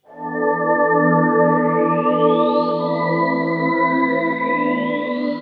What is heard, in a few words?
Synt